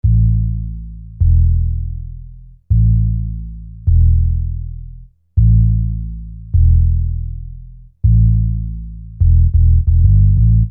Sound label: Sine Bass